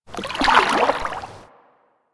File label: Water Lapping Gentle Small; Ambience; Warframe